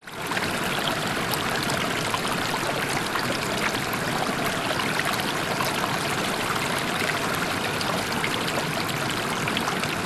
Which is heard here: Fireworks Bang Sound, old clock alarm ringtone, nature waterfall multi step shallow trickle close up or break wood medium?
nature waterfall multi step shallow trickle close up